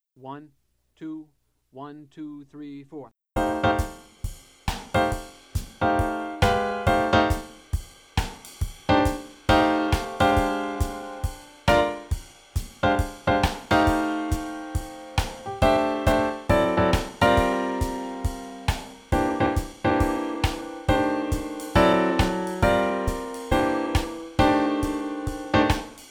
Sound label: Bass Only Improv